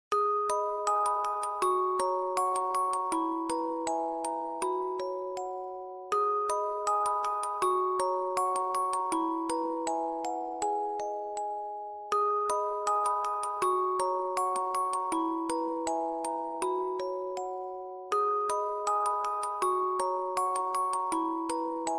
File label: windmill tone ringtone; lenovo